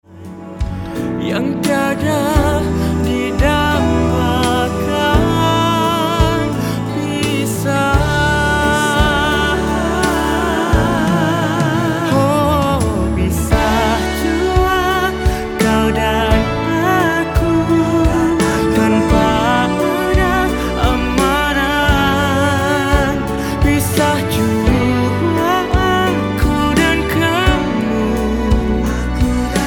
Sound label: ballad